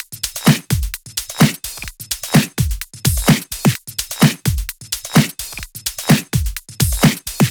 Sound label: 128BPM Dubwise Kit